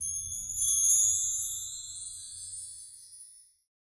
Magical (CHIMES); Memorial 1; Parvaneh Legacy of the Lights Guardians; windows gamerip 2016

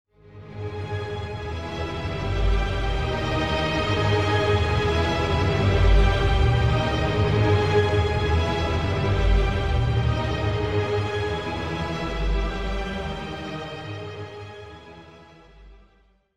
Scary; The Black Mirror

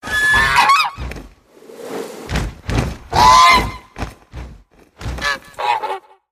Swan Fly By; the unfinished swan; Event Village Vinepit

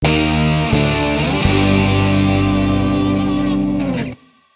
Music Second Character intro

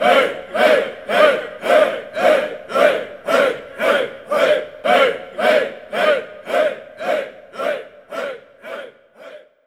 Intro Crowd; World of Warriors; PS4

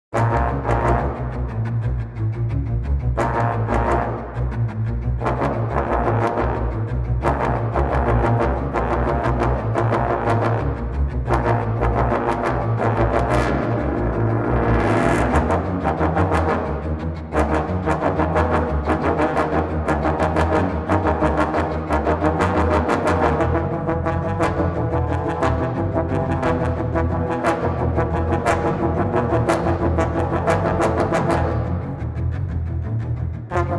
High Intensity Action Loop Second Layer; battlefield bad company 2

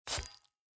sword Blood

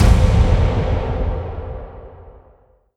dramatic reveal

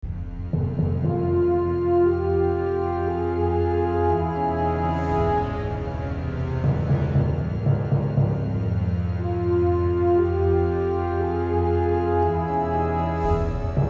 Mission Failed Music - Allied

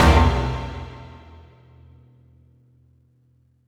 Mushroom Stinger Tail; Plants vs. Zombies Battle for Neighborville

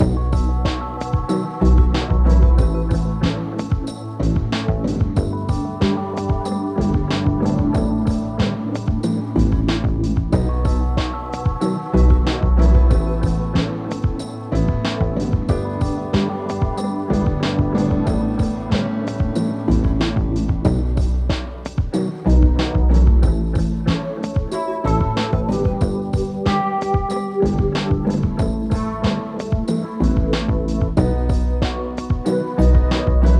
Lobby Base 93bpm; hot lava; ios macos windows

